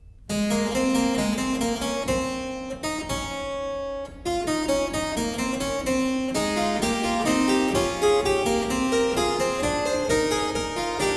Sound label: Prelude & Fuge in A major, Keyboard; No.19; BWV 888; Johann Sebastian Bach; The Well-Tempered Clavier; II Fuga